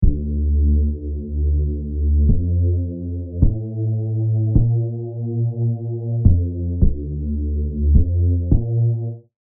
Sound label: serum basses; chiqui audio